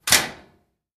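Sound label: Washing Mach Close Door, washing machine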